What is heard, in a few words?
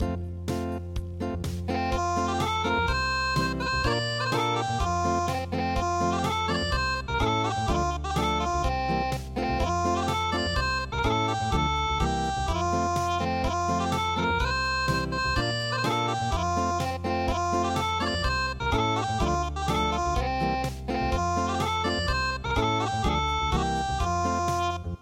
Unplugged; dwarf muku